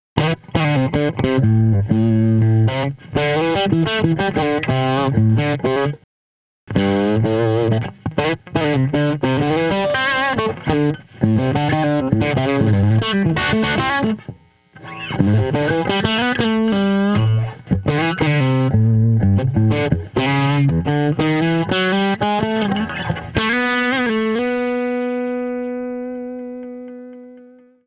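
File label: Blues Guitar Solo; Ending Solo; Digidesign; Pro Tools Essential Loops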